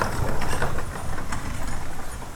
Horse Drawn Wagon; Electa Quinney

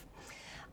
Birds

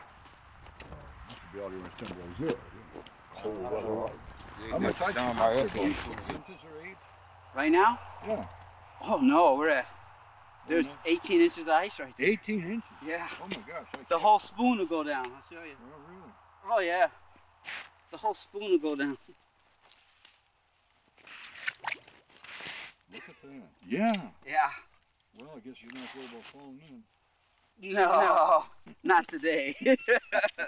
ICE FISHING; JERRY APPS WINTER

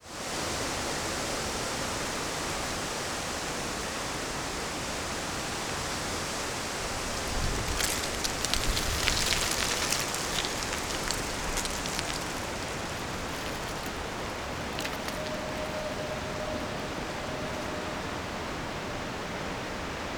Wind, Soft, Scatter, Leaves, Dry; Poetry Project

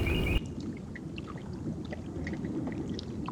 Mississippi Water

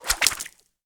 Whooshes, Arrow, Feather, Hit; Universal SFX

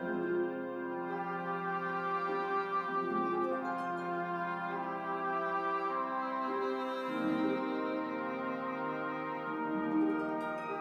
Music Only

